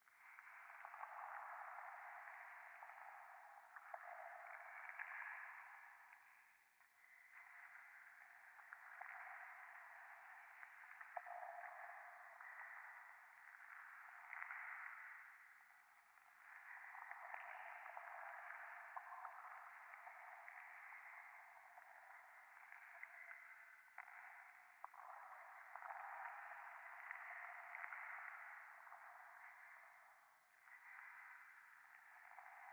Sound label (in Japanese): 左耳密着吐息 触手